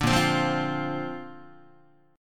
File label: Bb Major Add 9th, Bb, down, Guitar; strummed; JGuitar